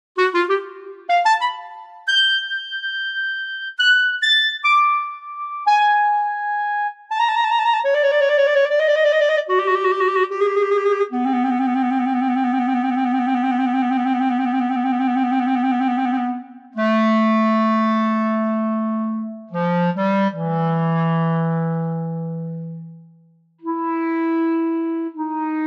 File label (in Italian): CLARINETTO celek